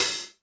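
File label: open hi-hat Room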